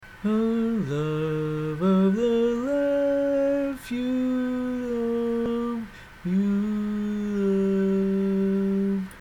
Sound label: Tenor, barbershop